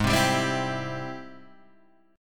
G sharp Augmented Major 7th G sharp Guitar Open G

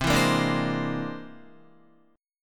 C Augmented 9th, Guitar; strummed; JGuitar

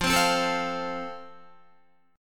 F Major, Guitar; strummed; chord

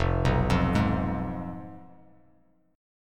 F Diminished, chord; Fdim arpeggiated; JGuitar

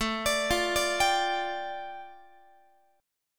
A 7th Suspended 4th Sharp 5th, A, down, Guitar; A7sus4#5 arpeggiated

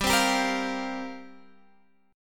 F Suspended 2nd Suspended 4th G

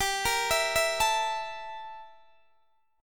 G Minor 6th Add 9th G down Guitar Open D

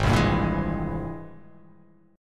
G sharp Augmented Major 9th G sharp; G#+M9 strummed; 4,3,5,3,4,3,x,x; JGuitar

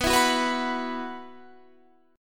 B Suspended 4th, Guitar, Standard; strummed; JGuitar

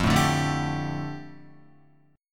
F 6th Add 9th, Guitar, F6add9 chord; F6add9 strummed

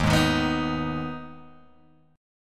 Eb Suspended 4th Sharp 5th, Guitar, Open G

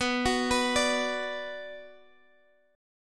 F sharp Suspended 4th Sharp 5th B

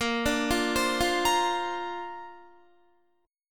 A sharp Major Add 9th, Guitar; arpeggiated

